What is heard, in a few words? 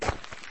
Footstep hard